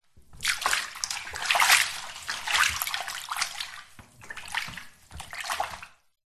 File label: household bath water very shallow movements room reverb; zapsplat